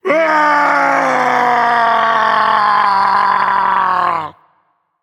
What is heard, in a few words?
fear, dragon; fafnir